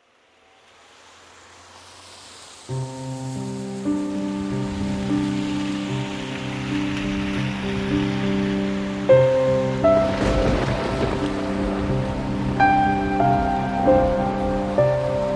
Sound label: Piano and Rain